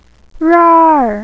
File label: rawr